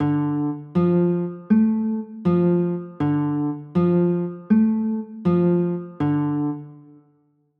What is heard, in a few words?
d m, chords